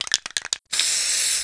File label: sprayer